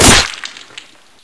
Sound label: spear stab; svencoop